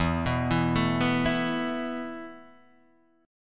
E Suspended 4th E, down; Esus4 arpeggiated

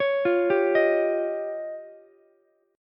F 7th Suspended 2nd Sharp 5th, Ukulele; F7sus2#5; arpeggiated; JGuitar

